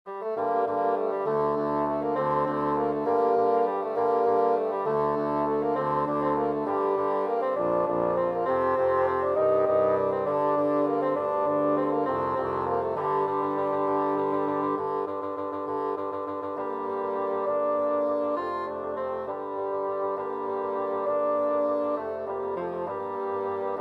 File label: bassoon quartet